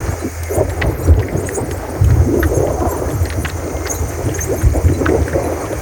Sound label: Rail Post Earth; Ninjago